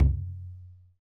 MADAL, DRUMS OF IND; AKAI S6000 CD-ROM; WorldSounds

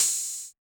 HH, Yamaha DJX 2B

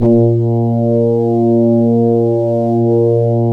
A#, Synclav FM, BRASS; Big Fish Audio

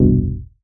stab dark F, Noise; stabs

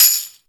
TAMBOUR K, Ragga & Dub Reggae

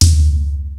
SPD-8 KIT; Rubber Chicken Software Sample Bank; DRUMS + PERC

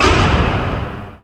FX HIT, Techno Trance; Ueberschall

